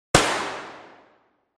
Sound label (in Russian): kolt 22 kalibr v interere